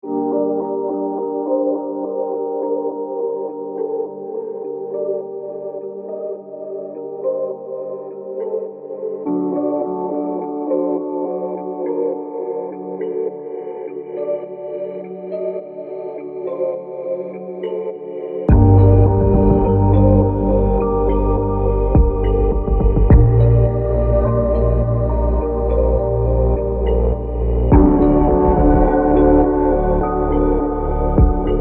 kalimba

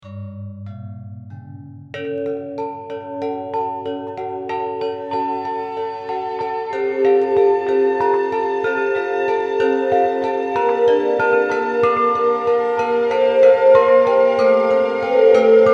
java mallets

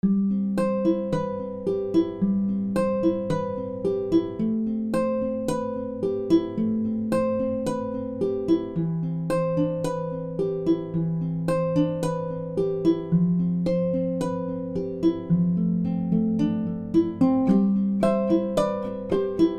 uku sad folk

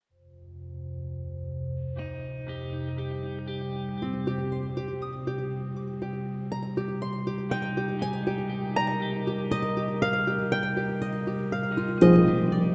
grandharp

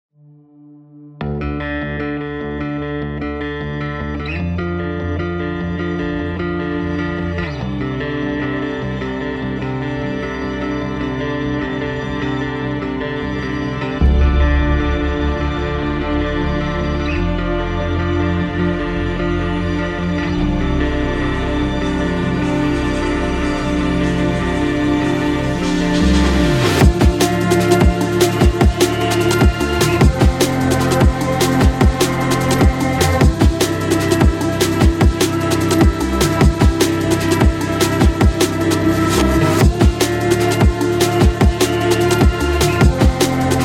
viola; vertigoviola

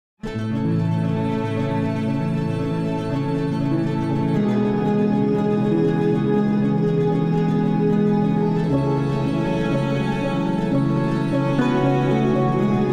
lute

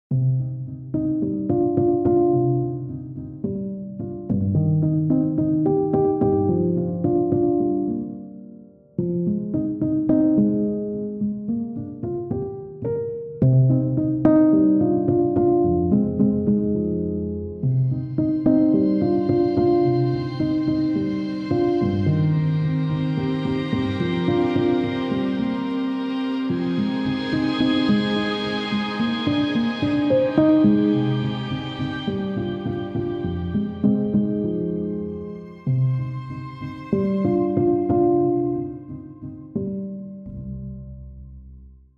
studio pianos

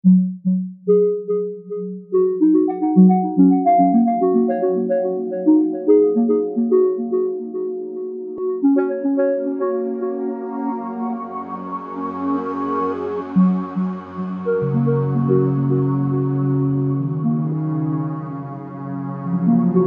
floating reeds

